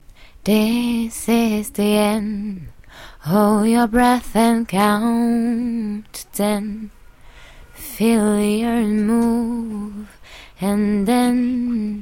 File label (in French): chante